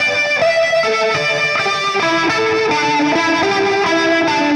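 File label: Delay Wah, Guitar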